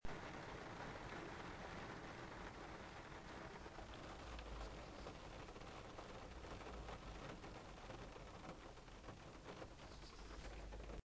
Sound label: rain in Dundee